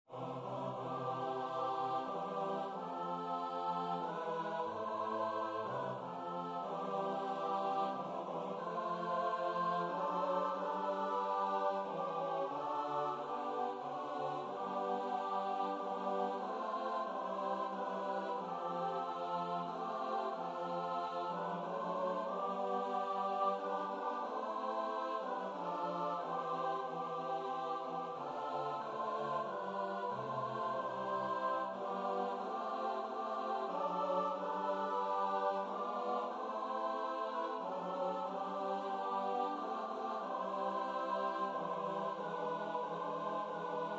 We sing in the Choir

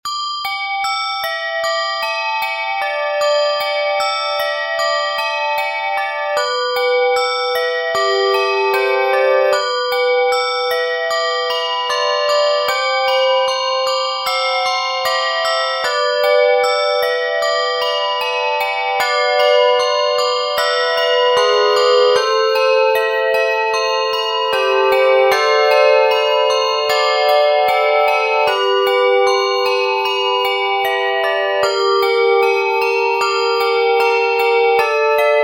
2 octaves, key of G, 20 handbells; Come Follow Me

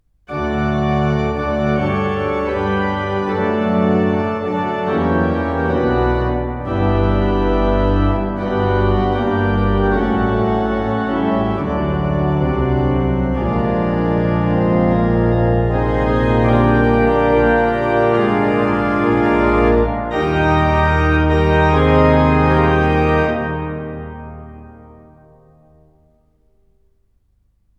modulation; Lord I Would Follow Thee; Hereford

